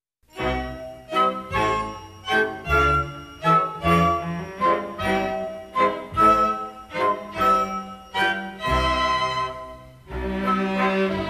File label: Hesitation Waltz; Cecile